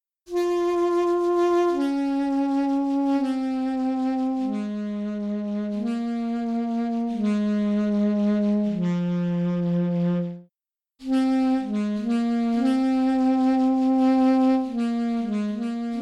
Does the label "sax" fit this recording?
Yes